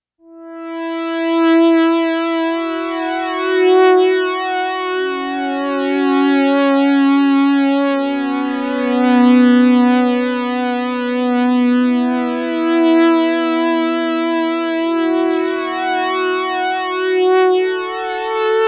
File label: alpha juno 2